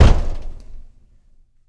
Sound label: Boxing Ring Bodyfall